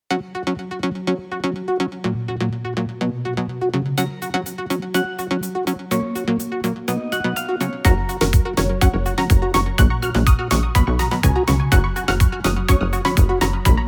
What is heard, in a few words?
minigame; Barbie; gaming music